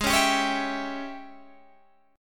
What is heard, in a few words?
Ab Major 7th Suspended 4th Sharp 5th Ab down Guitar Open G